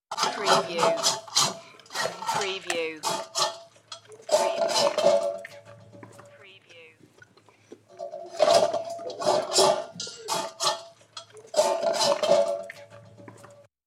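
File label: Dog Eat Metal Bowl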